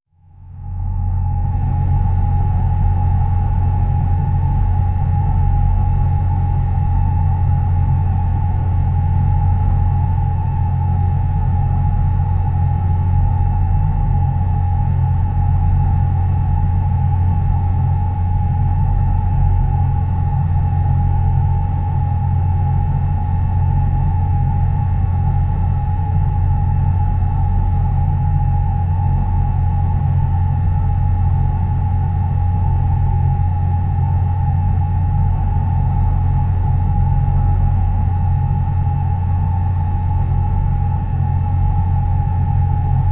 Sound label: sci-fi room ambience; room tone